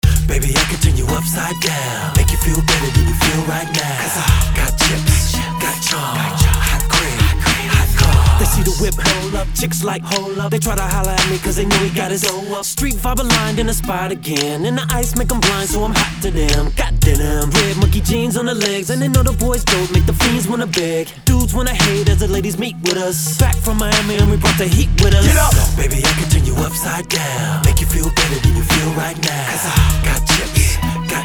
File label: with vocals